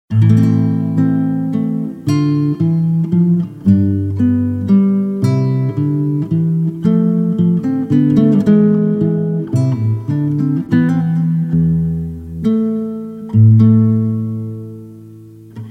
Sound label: Menuet